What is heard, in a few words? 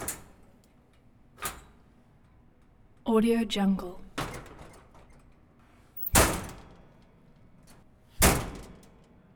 door metal locker open close; domestic sounds